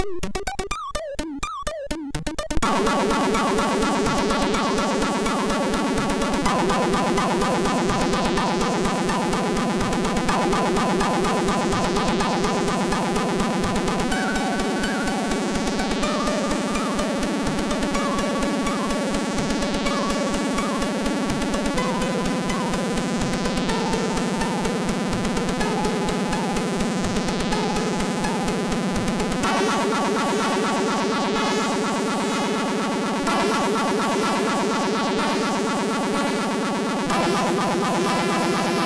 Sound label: Intro Tune, sid MOS6581R3